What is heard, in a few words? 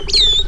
Glossy starling alarm call at a predator